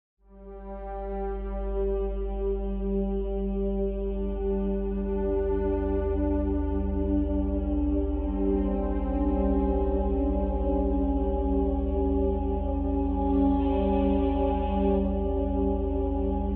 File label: Strings; Beauty